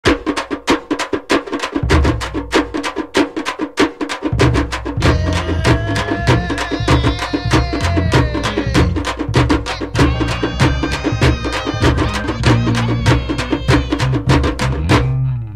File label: Drum Beats BGM; Jagame Thandhiram; Tamil